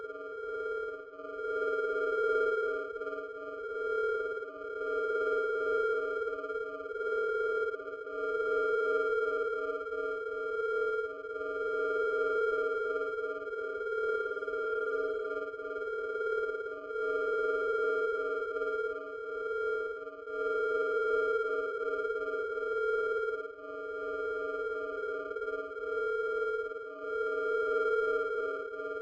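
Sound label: drone hover distant loop; Titanfall 2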